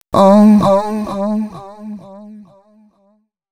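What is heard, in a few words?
female c# 135, Dance Ultra Voice, adlibs; Mutekki Media; Dance Ultra Voice VOL-1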